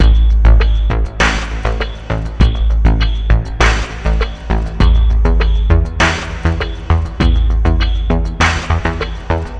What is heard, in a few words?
Future Ambient, music